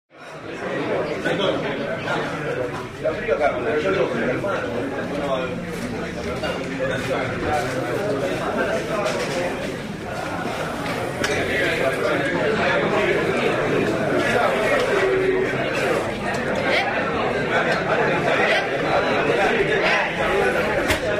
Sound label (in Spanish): bares comedor; balcarce y brown; el vomito